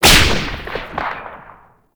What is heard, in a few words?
Whip Crack; Oraxia Whip